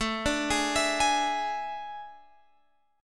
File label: A Major 7th Suspended 4th, Guitar, Open G